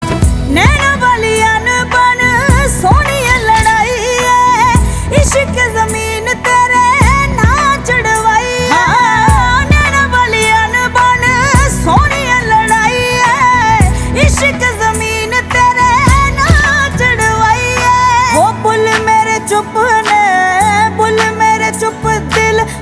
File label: Ringtone